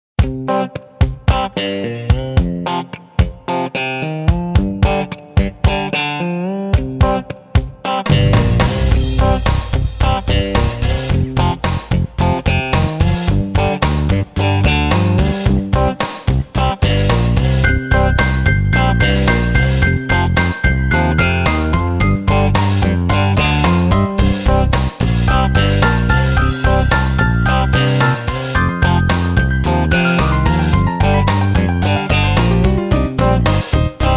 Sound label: Virtual Blues